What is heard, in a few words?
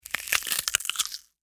Human Bite Food; Bite Food Apple Food Eat Crunch Rip Tear; Eating